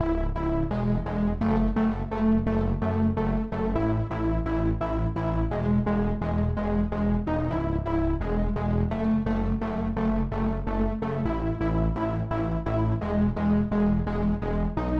128 BPM C# Min Chords, House; Loop Stems; Cymatics; Breakdown Loops; You And I

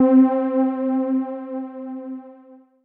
SYNTH Butter (C); Melodic One Shots; Cymatics; ANALOG EVOLUTION Beta

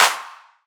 Riddim Clap

